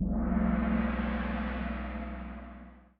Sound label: Gong